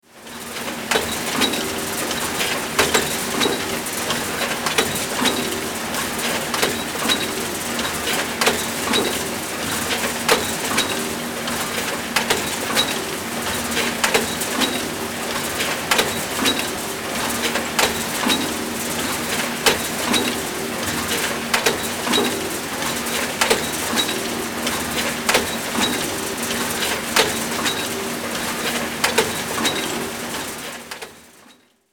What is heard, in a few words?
Box Machine